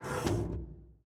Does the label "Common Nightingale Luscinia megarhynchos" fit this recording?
No